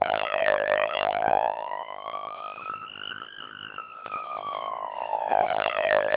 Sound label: siren codec2